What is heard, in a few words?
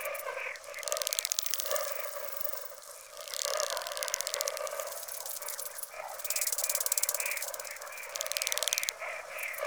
Landscape Mushroom Forest Loop, Ambience